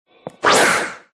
pokeball throw; Pokemon

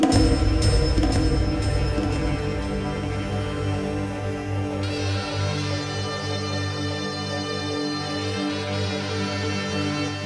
Quest Failed; MonsterHunter

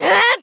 kiai; MonsterHunter; Fight

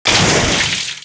attribute water, Monster Hunter, Monsters; Fight

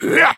kiai; MonsterHunter; Fight

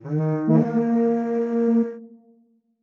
Quest Start; MonsterHunter

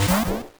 Ball Open; Pokemon

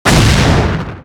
attribute fire; Monsters; MonsterHunter; Fight

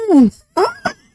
Lope Squeak

